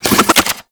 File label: Fist Spin; Warframe; Weapons; Archwing; Melee; Regor Fists